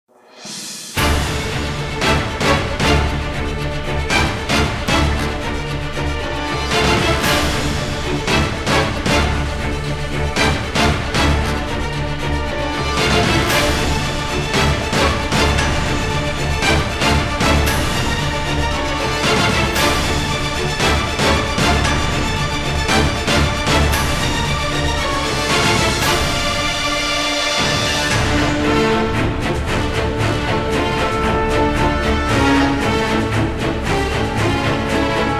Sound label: Tension, epic